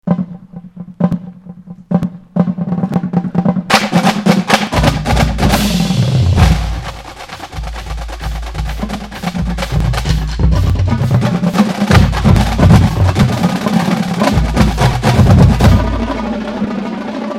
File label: Drum Line; DRUM CORP; Texas A & M University Drum Line